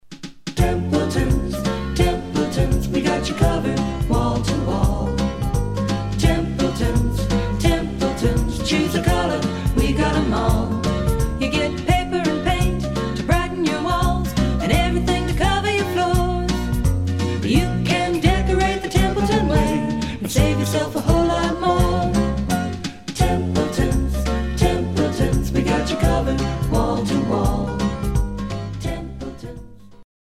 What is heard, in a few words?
jingle